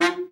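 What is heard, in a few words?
baritone, BARITON SAX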